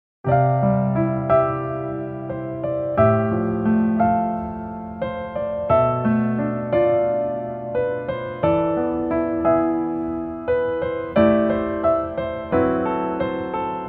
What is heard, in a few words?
Piano